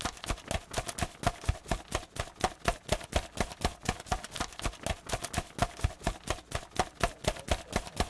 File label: Fly; FRACTIONS PIZZA